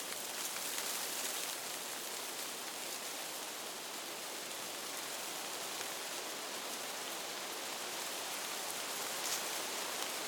RUSTLE Outdoor bush green foliage susurrate LOOP; Rustle Tones

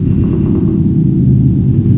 wall bit, ambient; hl2; kraken

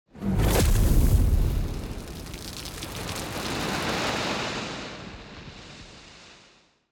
SPLASH, Slow Motion; Commercial Pack Shot Sweeteners; TheWorkRoom Audio Post; GDC 2020 - Game Audio Bundle

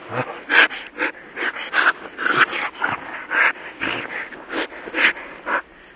alert loop, near; hl2

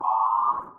Spaceship Interface; Membrans; Spaceship Interface Sound Pack 01

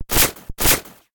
Cocking, Futuristic Guns; David Dumais Audio; Futuristic Guns Sound FX Pack 3

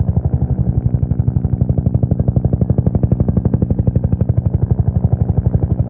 attack helicopter rotor muffle loop; hl2

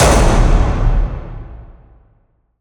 cinematic metal impact; Bluezone; Cinematic Metal Impacts

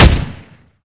npc smg fire, weapons; hl2

